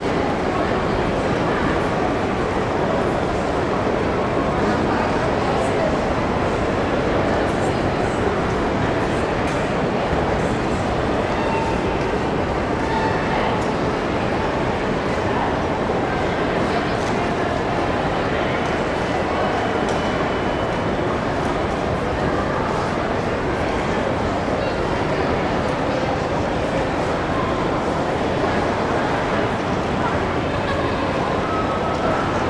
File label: ambience london waterloo train station busy